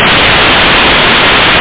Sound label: clap